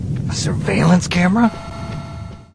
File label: Surveillance Camera; Metal Gear